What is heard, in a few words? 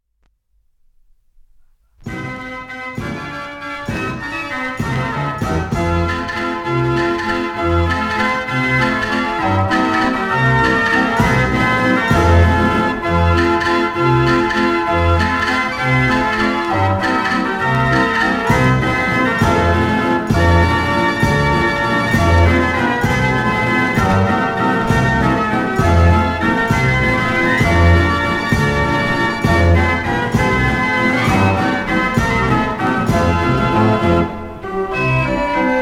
draaiorgel